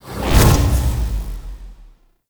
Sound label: Magnetic Field Cast; Warframe; Focus; Unairu